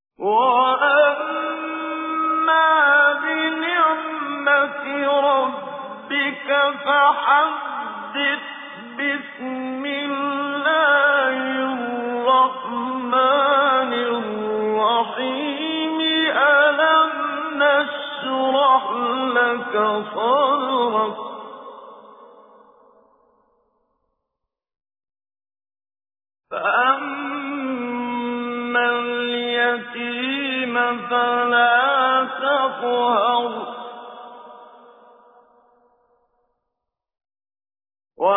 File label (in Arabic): سورة الشرح, تجويد; المقريء الشيخ محمد صديق المنشاوي